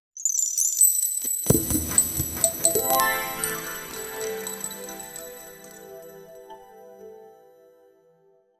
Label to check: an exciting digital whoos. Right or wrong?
Right